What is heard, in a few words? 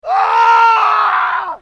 male death scream; hollywoodedge